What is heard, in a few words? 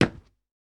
footsteps wooden floor; 足音